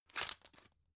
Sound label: drug pull out hard; berufe; arzt